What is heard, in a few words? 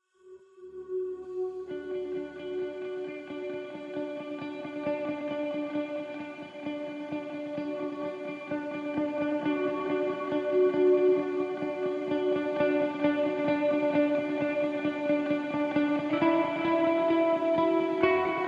Ambient Mix